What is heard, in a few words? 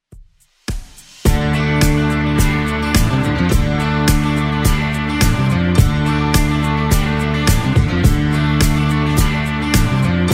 70s Rock Redrum 106bpm